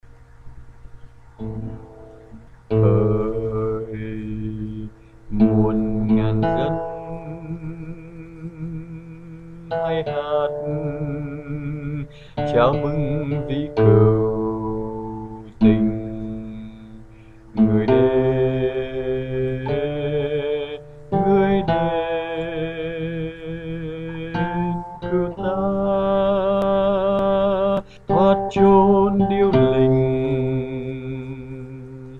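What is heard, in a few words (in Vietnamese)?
Chúa Ra Đời, Bass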